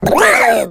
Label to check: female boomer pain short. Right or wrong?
Right